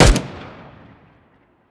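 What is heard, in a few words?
m4a1 unsilenced, weapons; csgo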